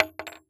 Weapon Bullet Shell 3030 Shell Cases Fall On Boardwalk; AdobeCC